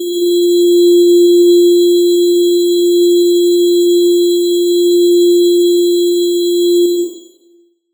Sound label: Riddim Melodic Hit F Aliasing; One-Shots; Ghosthack; Riddim Essentials Volume 2